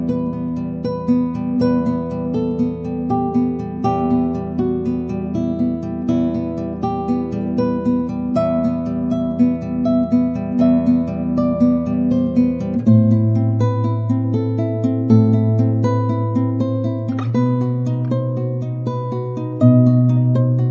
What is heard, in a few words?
spanish romance